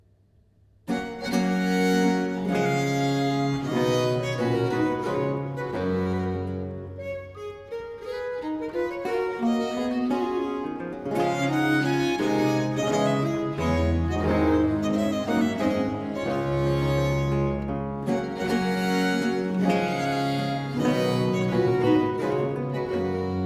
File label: suite no. 6 in four parts, in g major, viols